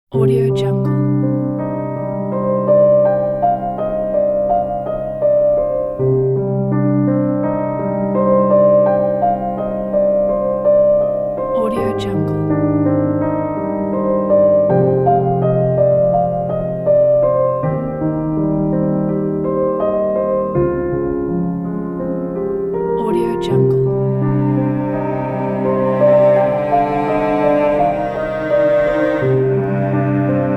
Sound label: melancholy for piano cello orchestra